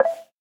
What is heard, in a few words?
Accordion Open